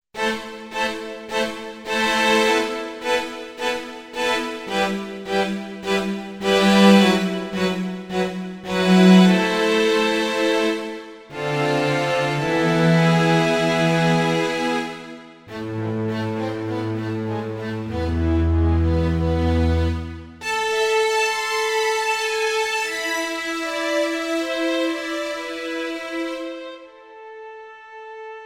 Dynamic Strings

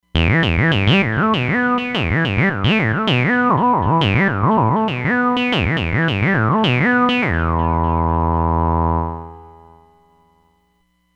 TB Sequence, synth

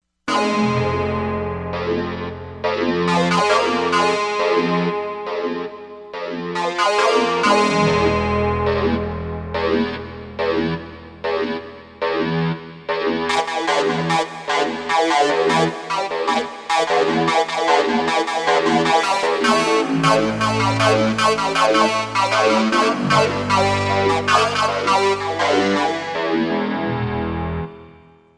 Res Wave, Synth

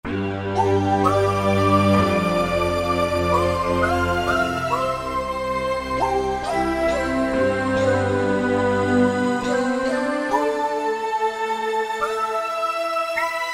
Japanese Flute Orch; Optical Media International Sonic Images Library

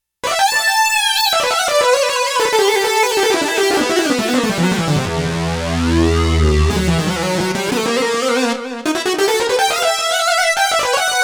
Syn Lead 1 & Syn Lead 1, Roland JX-1; Factory presets; Dual patches

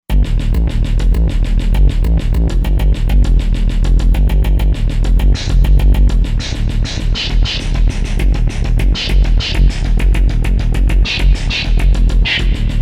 Generator, synth

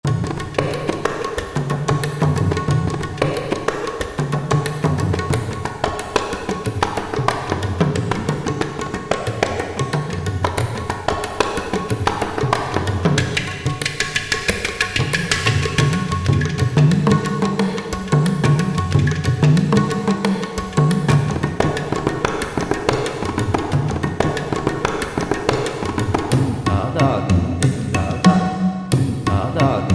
Indian Percussion Ensemble; Spectrasonics Heart Of Asia

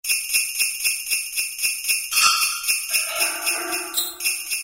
JINGLEBELL; Latin And F.X. Percussions; Roland SN-U110-02

